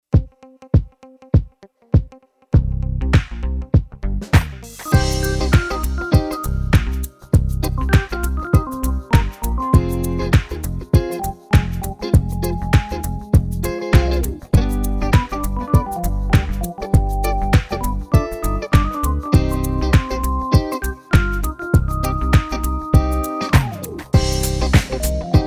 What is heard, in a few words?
Full Organ